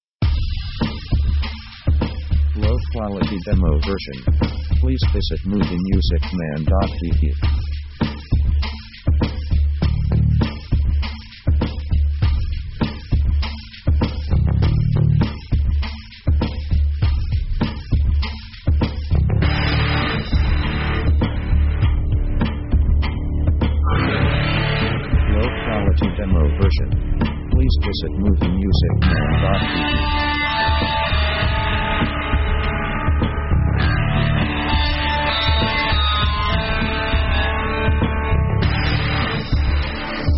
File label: rock time machine beat